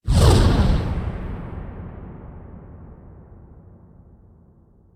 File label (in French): Carburant Start; Puddle